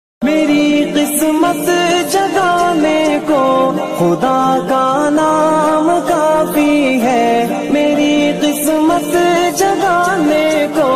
Islami Ringtone

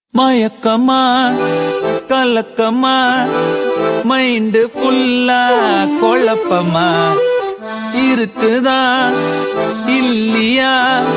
Tamil Ringtone

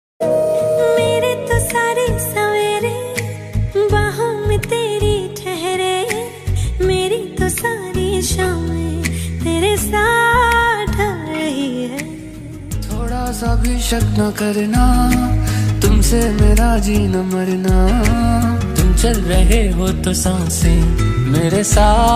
Romantic Hindi Song Ringtone

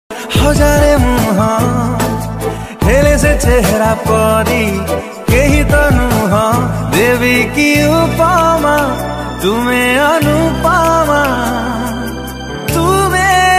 Lovely Odia Song

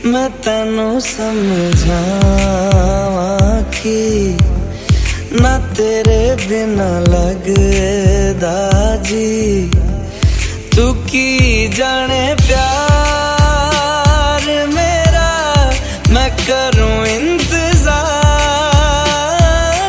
Sad Hindi Ringtone

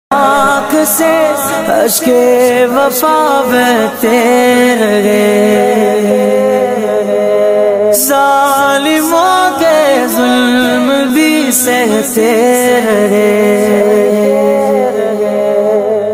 Islamic Naat Sharif

